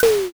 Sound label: door open